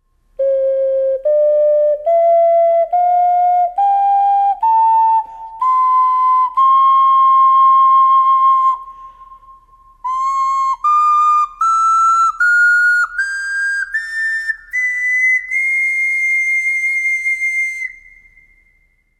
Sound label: 6 hole double